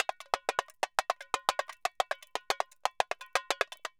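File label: tamborim, DRUMS SAMBA; Best Service ProSamples vol.14; AKAI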